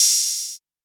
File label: LOW TIGHT OPEN HAT; Open Hats